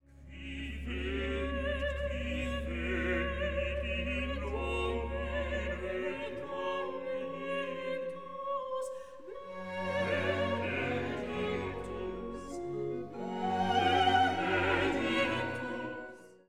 Benedictus Slowing

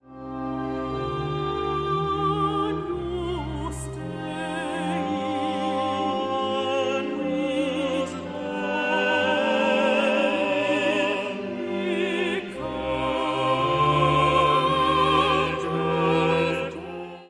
Agnus Dei Soloists

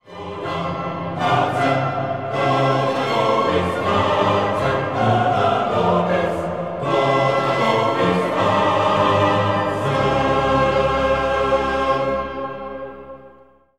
Dona nobis pacem final chorus

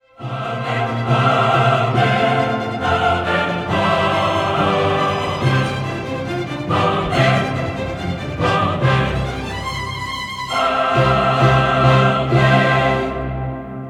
Gloria Final Amen; KV257 Gloria

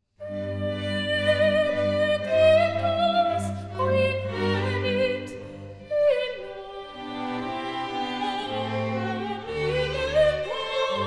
Benedictus Soprano

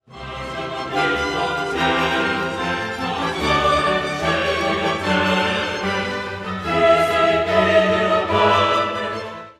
Credo Patrem omnipotentem

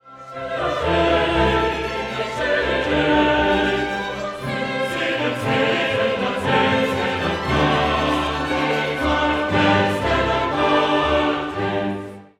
Credo Et ascendit

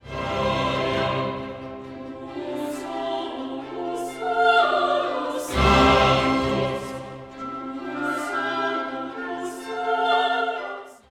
Gloria Quoniam

